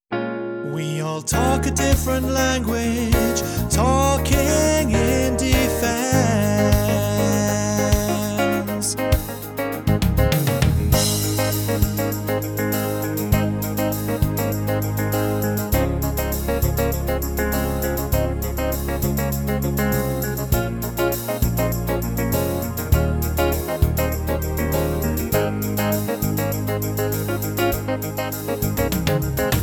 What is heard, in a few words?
Vocal